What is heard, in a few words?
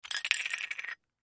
Jingle Bell Down, Jingle Bell Set Down Drop Wobble Christmas; Foley; Holiday; SoundEffects-Blastwave